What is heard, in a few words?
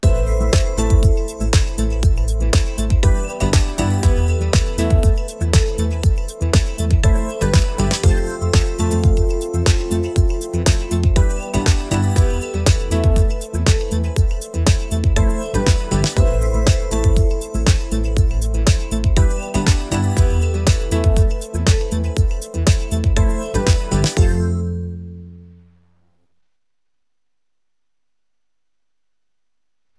music; AceStep Turbo